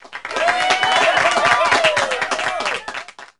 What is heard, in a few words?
clapping and cheering; gameplay cleared; DDR Extreme SFX; Announcers; Dance Guru's DDR Archive